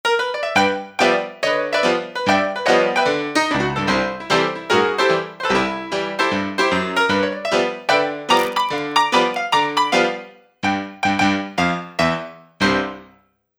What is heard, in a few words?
Honky-Tonk